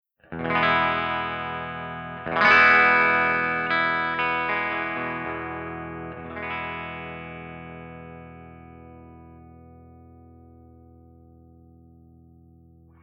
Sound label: FENDER TWIN BRIGHT